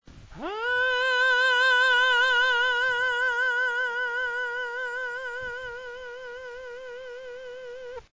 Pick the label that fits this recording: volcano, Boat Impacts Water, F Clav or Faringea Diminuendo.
Faringea Diminuendo